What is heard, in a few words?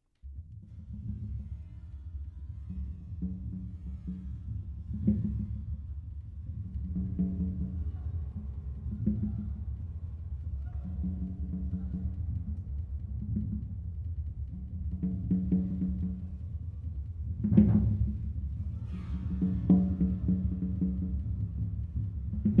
Thunder Over Deep Water; IChing